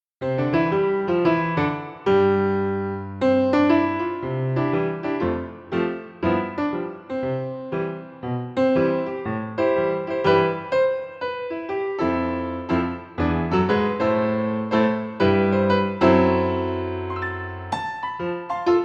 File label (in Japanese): ピアノ